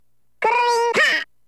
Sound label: Brriing; Yoshi